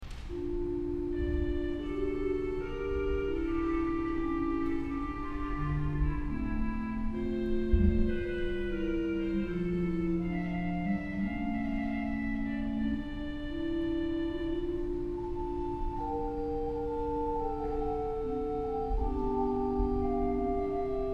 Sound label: Organ